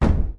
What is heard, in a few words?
Jester Stomp